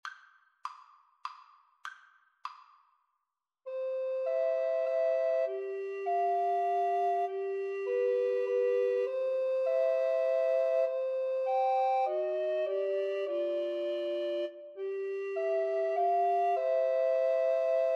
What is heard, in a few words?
happy birthday, Recorder Trio